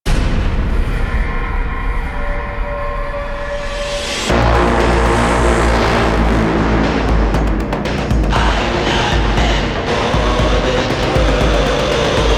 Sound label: Tunnels Outro Cue; Warframe; Dynamic Music; Cues